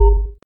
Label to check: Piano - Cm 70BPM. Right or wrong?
Wrong